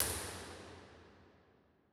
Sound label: Impulse Responses, Bigger Spaces L; Crusades; Ableton Live 12 Lite